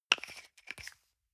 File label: toothpaste screw cup close hard; haus-garten; badezimmer